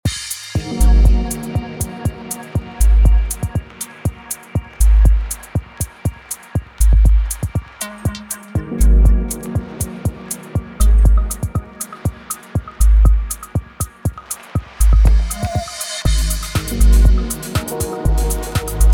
bass dub tech; bass master

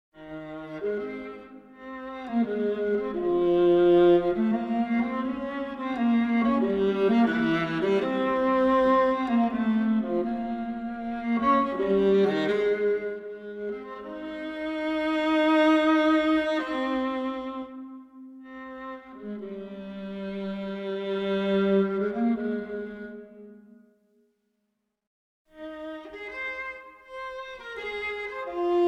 symphony viola; Beethoven

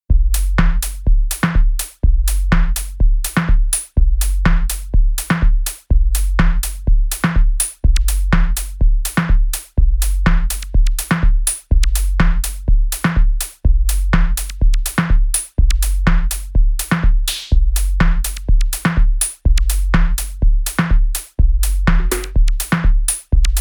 drums lofi house; vco drums vol2

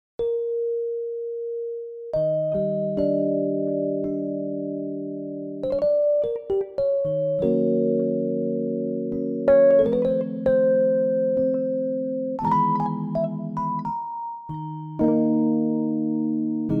bells preamp + reverb

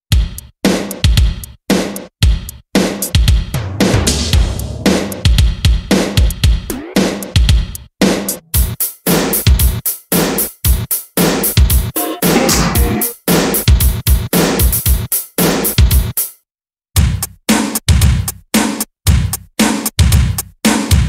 e funk synth

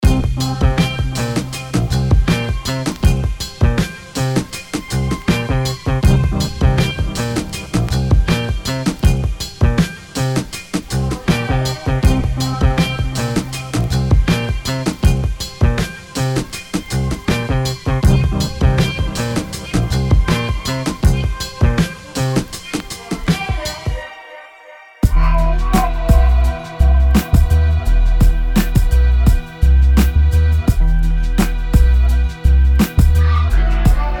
vintage hiphop

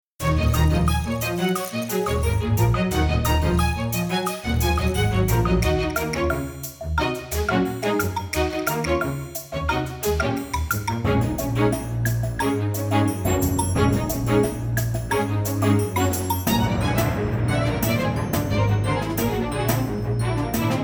orchestral groove kit

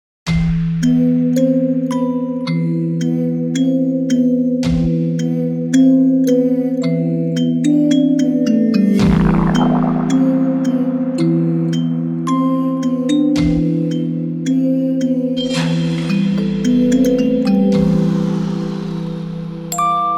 chorus

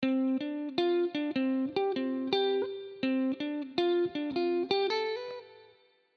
strato wet; Chiqui Audio; Free Wet Melody 10 160 Bpm D Min; Fender Stratocaster

